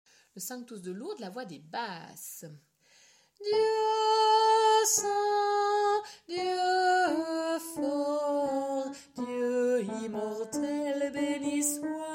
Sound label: sanctus de Lourdes basse